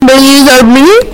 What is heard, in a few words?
man scream